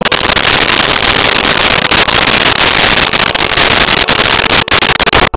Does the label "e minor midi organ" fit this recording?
No